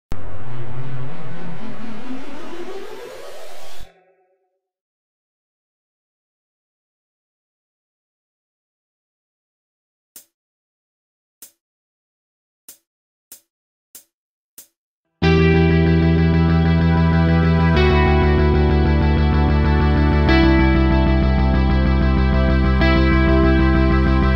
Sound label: karaoke version